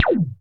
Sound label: DOPPLER ZAP